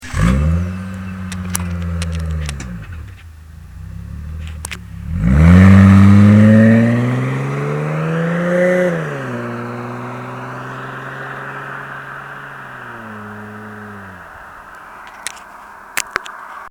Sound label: engine after, delica